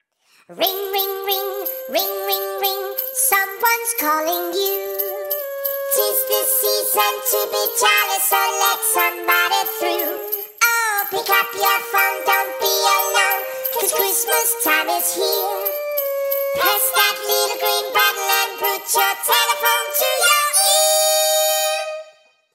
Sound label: Chipmunk Jingle Bells